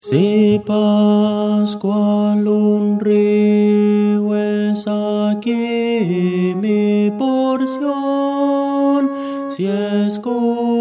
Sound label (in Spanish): tenor